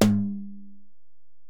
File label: Tom, Alesis DM-PRO, Drum Machines, Toms